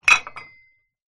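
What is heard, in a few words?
Weight Bar Bell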